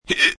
Hiccup Drunk, Hiccup, male, classic drunk (human voice); Hiccupping; VoiceBox